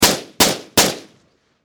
Warfare Machine Gun 5.6 Caliber Sig Sg 551 Triple Shot Distant Perspective